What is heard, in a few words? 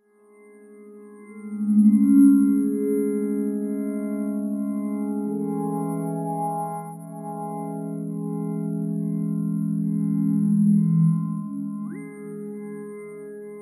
Slow World, Harmonic Atmoshperic